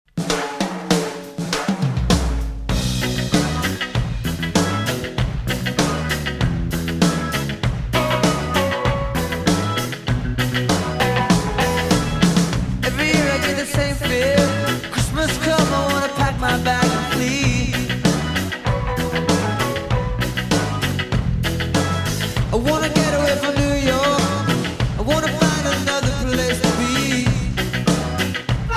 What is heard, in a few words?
reggae christmas